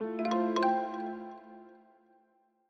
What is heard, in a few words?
Foreground; Longhorn Ten Alfa Sound Scheme by David Mounder